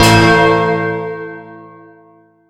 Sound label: survival team rec